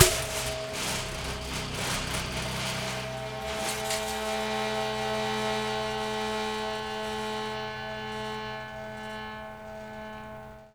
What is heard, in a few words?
Prepared Drums